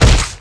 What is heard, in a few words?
body smack, skater; Tony Hawk's Project 8; XBOX